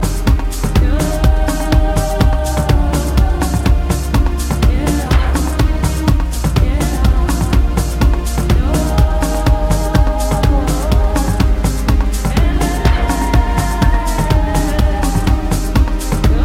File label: ALT VOC